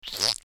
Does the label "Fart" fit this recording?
Yes